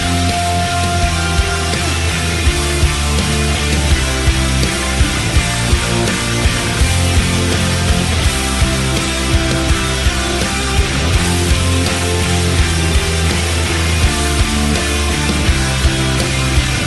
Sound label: amazing guitar sound